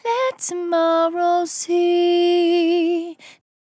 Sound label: On A Star 65bpm F, Vocal; Zero-G